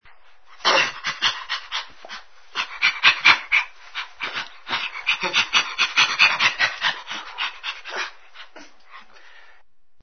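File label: MONKEY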